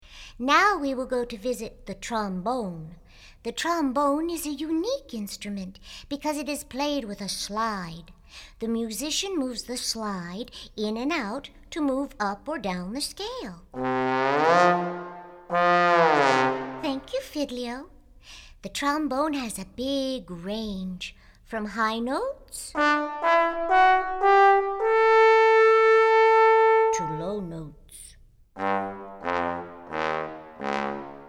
Trombone

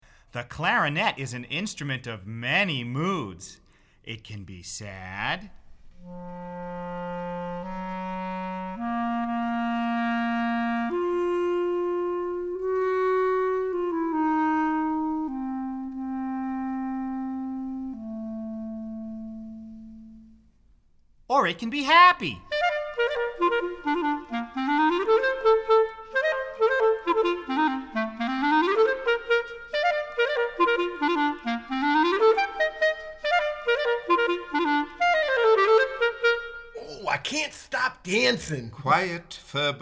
Clarinet

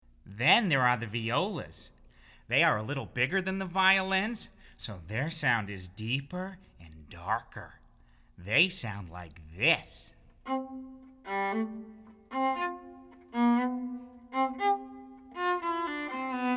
Viola

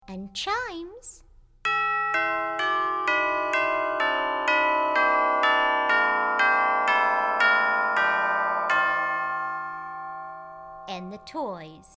Chimes

